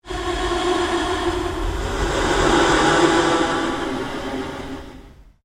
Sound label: PULSE LONG SWEEP